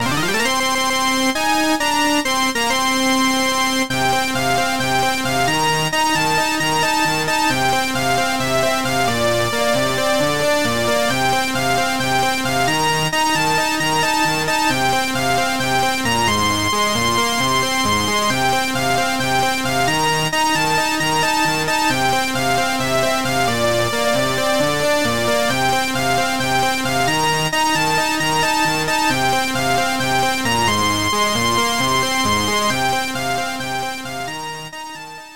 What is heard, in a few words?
Victory Fanfare